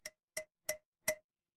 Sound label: glass glass tap